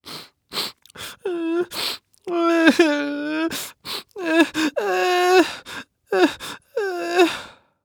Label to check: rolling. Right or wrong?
Wrong